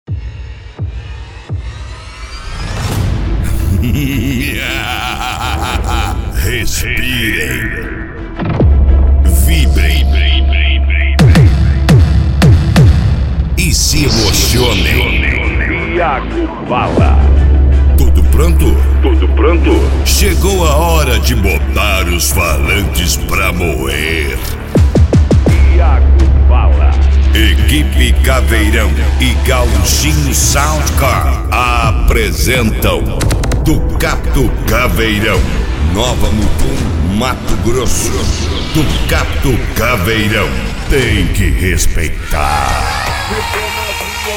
especial mega funk; abertura

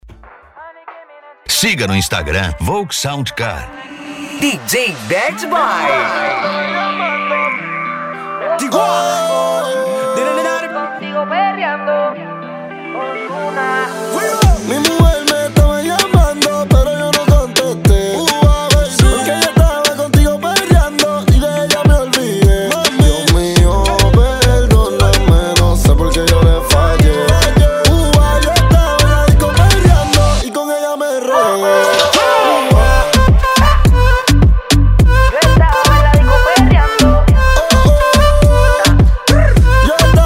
sound car reggaeton; cd volks sound car

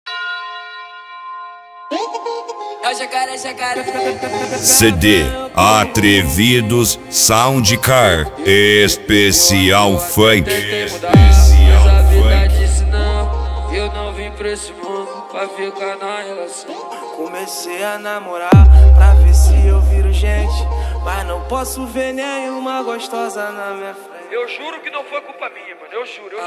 funk

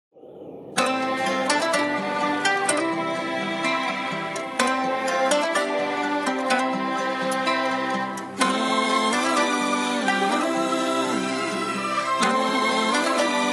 remix gospel

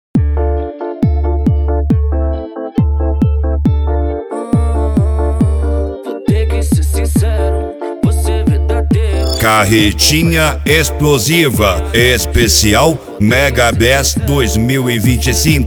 mega bass

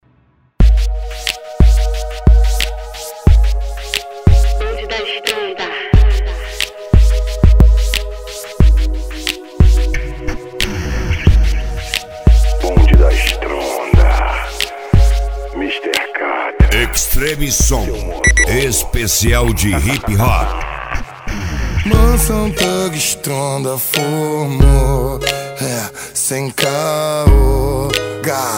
hip hop

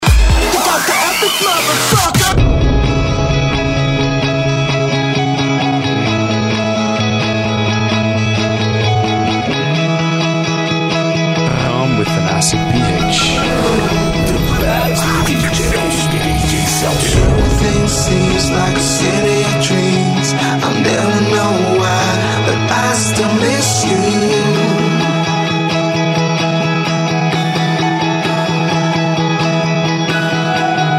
electro house

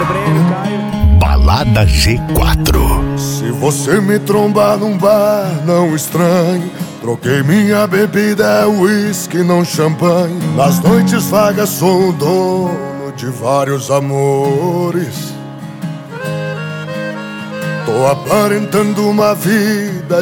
sertanejo; balada g4

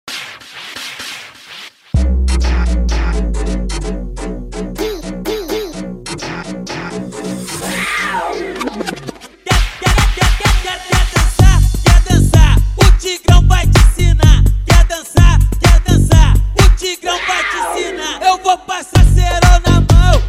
retro

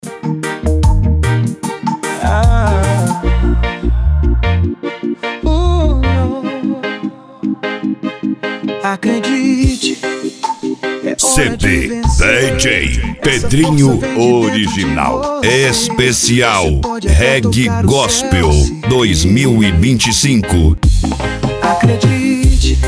reggae gospel

